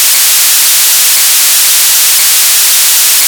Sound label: noise 1E